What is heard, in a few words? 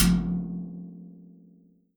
Bath